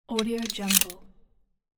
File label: lock